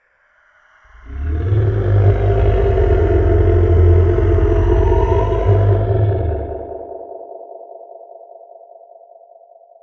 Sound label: Monster growl